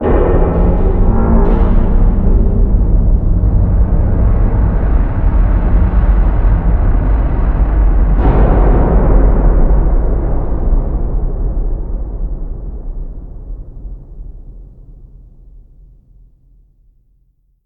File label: Rig Rotation